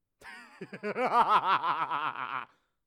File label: Laughter